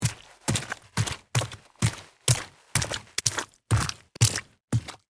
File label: Footsteps on mud shoes fast walk